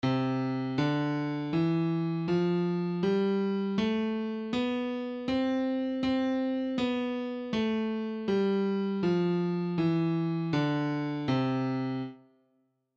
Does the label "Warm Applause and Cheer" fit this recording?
No